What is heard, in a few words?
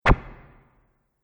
Multimedia Button; Andrew Fleming